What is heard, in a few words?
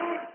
beeperr